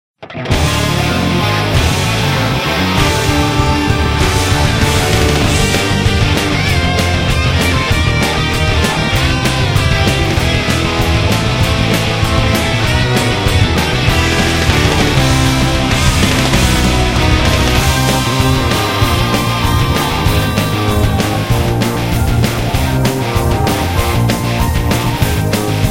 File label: guitar ver; Baten Kaitos - Eternal Wings and the Lost Ocean